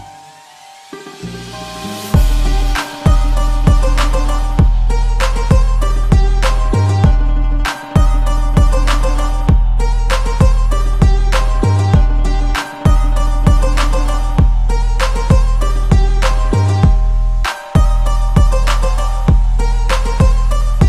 Arabic Trap; Gta Sa

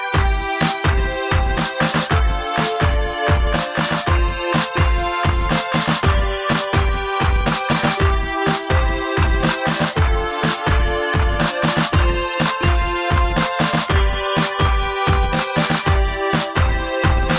Circus

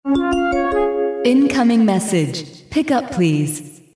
Incoming Mesage Pick

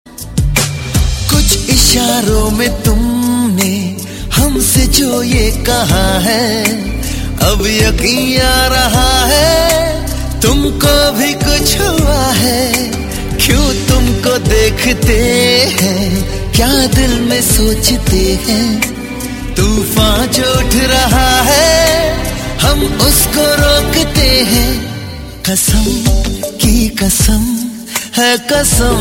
male

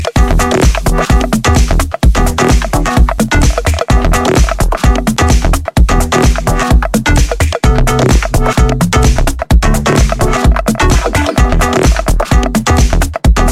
Electrohouse

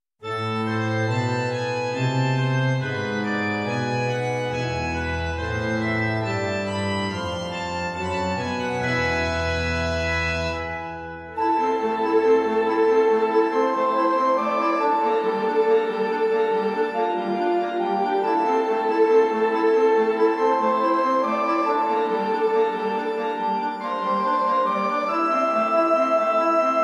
Baroque

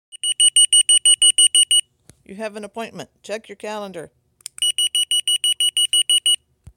Calendar appointment

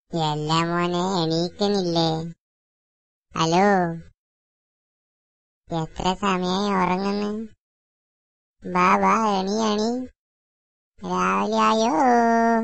Malayalam Alarm

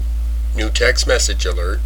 New Text Alert

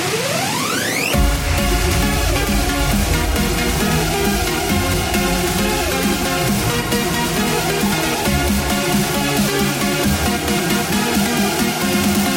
Trance

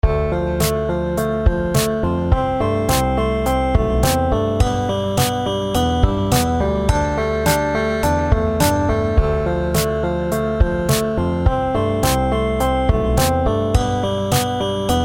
Beats

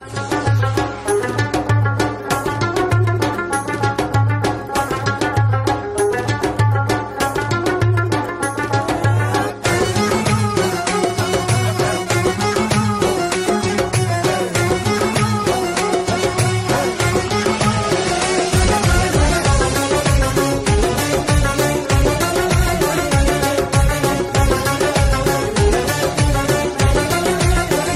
cool music

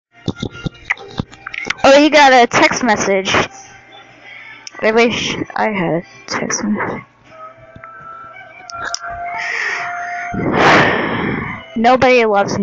New text message

